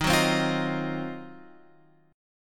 D sharp Minor 6th Add 9th D sharp, chord

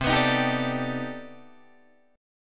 C Minor Major 13th C down Guitar DADGAD

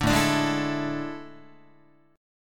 A Major 7th Suspended 4th, Guitar

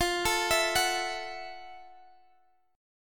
F Major 7th Flat 9th; arpeggiated; chord; FM7-9; JGuitar

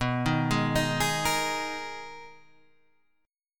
Eb Suspended 4th B; Ebsus4/B arpeggiated; JGuitar

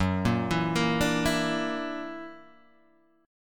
F sharp Augmented 7th; F#+7 Chord {2 1 2 3 3 0} arpeggiated

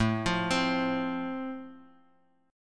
A Minor Sharp 5th A, Guitar, Open G

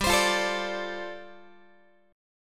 G 7th Suspended 2nd Suspended 4th G, Guitar; G7sus2sus4 strummed

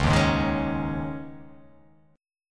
C sharp Suspended 2nd, C sharp; C#sus2; strummed; JGuitar

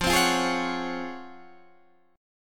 Db Major 11th F

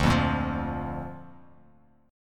D Minor Sharp 5th D down Bass Standard; Dm#5 strummed; JGuitar

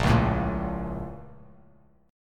A sharp Minor Major 7th, Bass, chord; A#mM7 strummed; Bass Standard; JGuitar

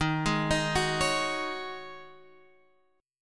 Db Suspended 2nd Suspended 4th Eb, chord

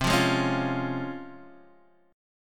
Db Major C, Db/C chord; strummed; JGuitar

